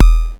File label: BD, Mutable Instruments Anushri